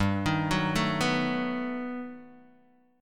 G Augmented Major 9th G down Guitar DADGAD

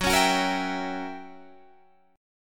Fsharp 7th Flat 9th down Guitar Open D

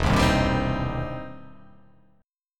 E Minor Major 7th Sharp 5th, E, down

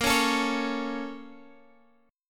A sharp Minor Major 7th, Guitar Drop D

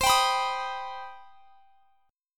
C Minor Major 7th C; CmM7; strummed

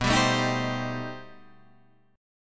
B 7th Sharp 5th Flat 9th, guitar

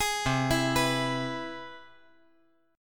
C Augmented Major 7th

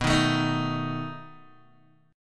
B 7th B down Guitar Open D

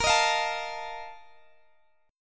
A sharp Major 7th Suspended 4th, Guitar DADGAD

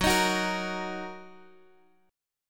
Fsharp 6th down Guitar Drop D

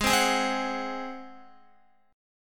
G sharp 7th G sharp, Guitar; G#7; strummed

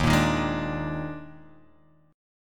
D sharp Major 7th Suspended 4th, D sharp, down; strummed; D#M7sus4; JGuitar

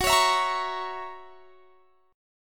Gb 7th Gb down, chord; Listen to Gb7 strummed

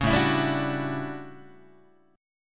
A 13th A down Guitar; strummed

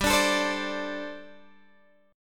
G Suspended 2nd Suspended 4th, Guitar Open G

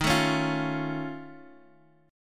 F Augmented 7th D sharp, chord

